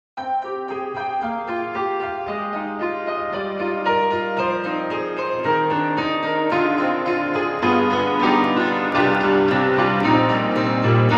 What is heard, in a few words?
Grand Rhapsody Piano Chorus Echo; Waves